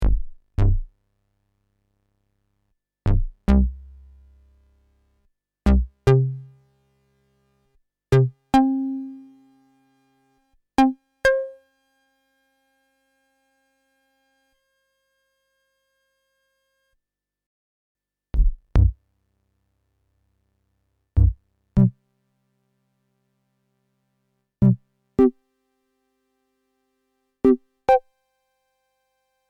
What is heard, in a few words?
bleeding filter